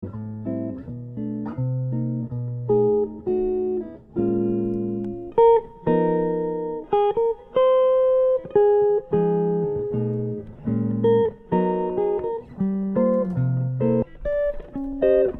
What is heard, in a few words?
jazz elite natural blonde, guitars; eastman; 17